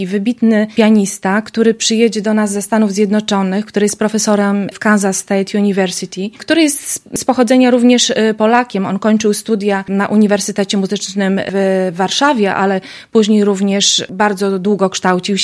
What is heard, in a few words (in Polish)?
fortepian